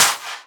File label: Claps; Vengeance; Essential Clubsounds Vol.4